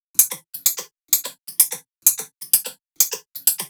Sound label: drum loop 128 Top; Electro; drum loops; Anthemic